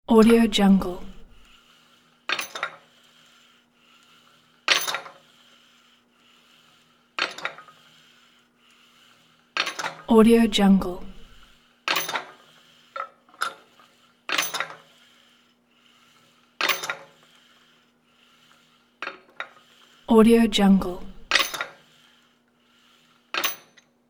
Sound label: rowing machine with weight; sound ideas